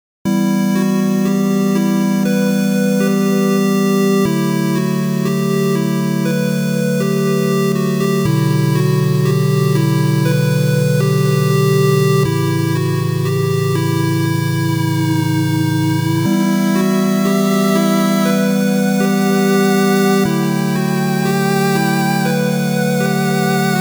Game Over (8-bit); Lenna's Inception

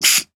wet squirt

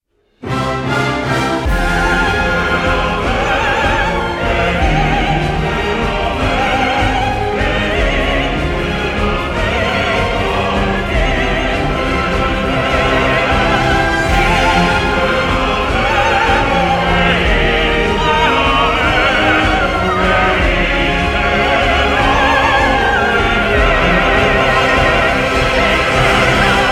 BGM combat boss loop; Babylon's Fall

